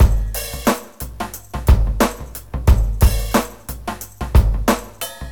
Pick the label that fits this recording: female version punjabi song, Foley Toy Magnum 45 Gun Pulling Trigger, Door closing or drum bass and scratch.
drum bass and scratch